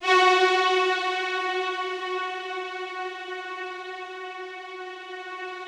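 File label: Rough Strings F#3, Yamaha VP-1; 90 s Sample CDs; Music Store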